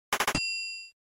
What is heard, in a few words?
SNES RPG Purchase